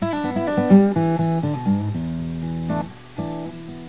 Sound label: guitar